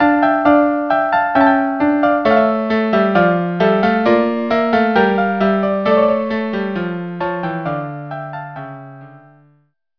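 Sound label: Marche violin violin cello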